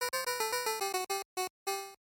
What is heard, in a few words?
game over; woody; Toy Story